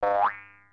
spring

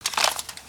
idle rattle; creatures organic

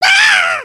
freakout; emotes

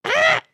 Angry, emotes

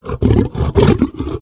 Pets Lion Happy; creatures pets; Lego

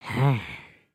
Idle Bobbing; creatures organic; Lego; Strombie

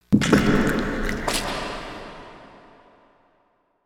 Cave Ambience; Skullken; ninjago

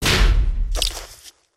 hedgehog smash; destructibles

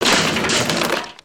Garden Tools Smash; Pet Rock; destructibles

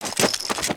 Dance; Skullken; creatures organic

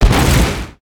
terrain blast; creatures organic

